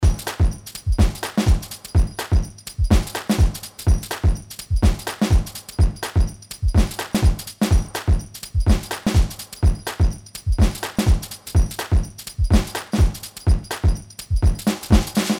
125 Full Drums

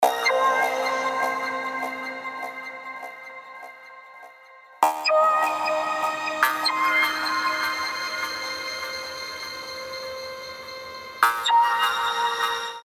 75 C Elastic Syn

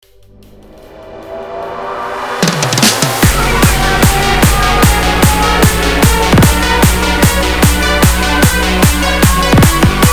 Neo Dubstep Wobbles; Serum

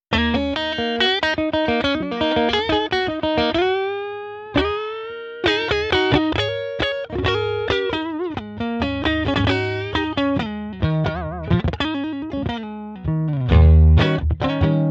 Blues OD Solo FX OFF

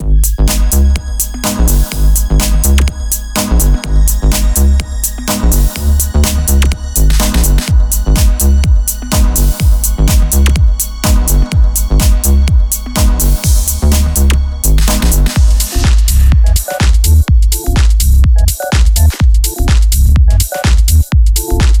Prophet-12; Zampler

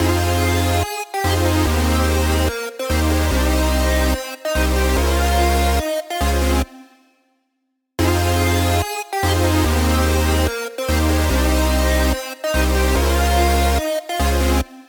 Chords Side High Shelf

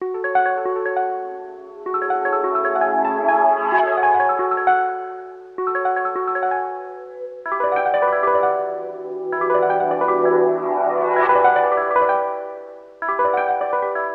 Cassette Plucks; Ableton Live

